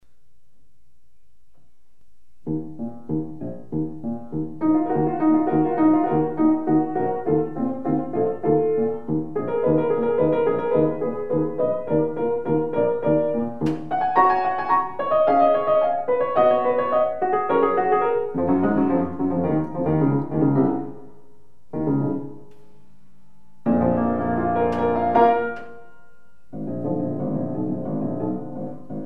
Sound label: Piano Solos; Instrumental; Classical; Puck